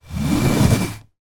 household chair small drag carpet; zapsplat

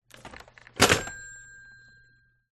old rotary telephone receiver 1986 down hard

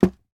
household lamp small put down on wooden table; sound effects one

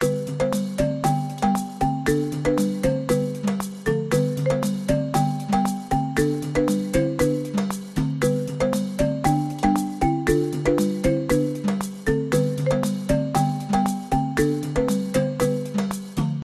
african fun

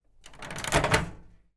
household microwave oven door open

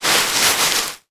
foley leaves dried autumn many movement hands; zapsplat

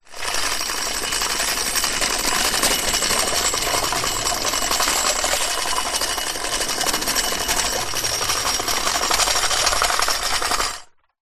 robot android metal old roll wood surface; science fiction

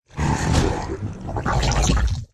horror monster swamp growl slimy wet

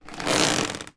household chair wicker movement squeak creak

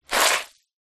foley wet clothing squelch; zapsplat

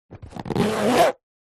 household makeup bag thick zipper

